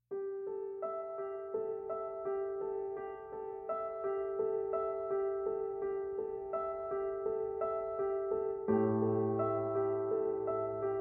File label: Piano Only